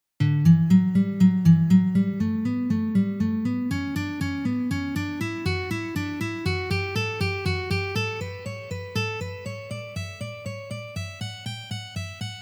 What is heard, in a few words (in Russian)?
Uprazhnenie dlya igry gammy Re mazhor